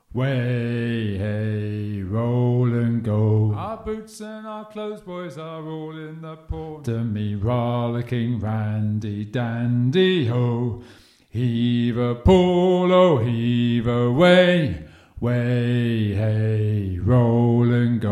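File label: Randy Dandy Oh - bass, sea shanty teaching track; eccleshall singathon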